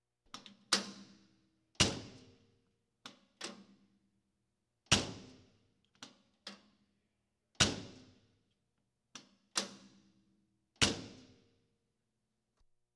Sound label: Police, Fire engines